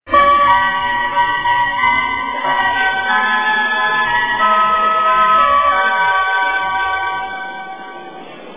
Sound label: airy, melody